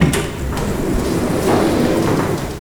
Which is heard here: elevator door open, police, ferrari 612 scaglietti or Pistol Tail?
elevator door open